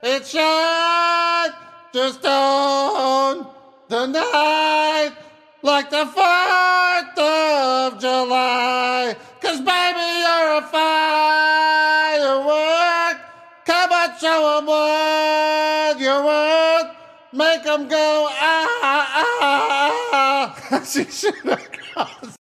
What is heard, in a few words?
fireworks; itmejperry